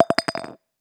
Glass, Jar, Falling, From 2 Feet, Bouncing, Mic Inside Jar; Soundreorganized; Everyday Actions 1